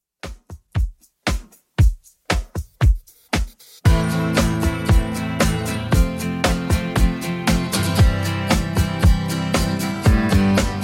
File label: 70s Redrum 116bpm